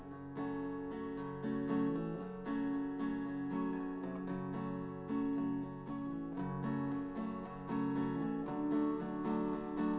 wdrums liverec 250ms 5cm; SiSEC11; underdetermined

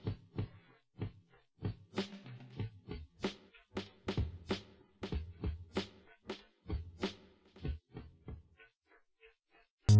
wdrums inst sim; SASSEC07

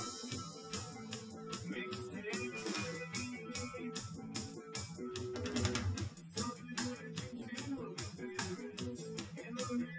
wdrums liverec 250ms 5cm; SiSEC08; SiSEC underdetermined

